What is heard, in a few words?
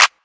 Clap